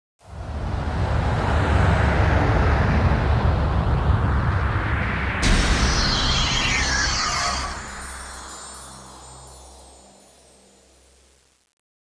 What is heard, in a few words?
Logo; Blizzard Entertainment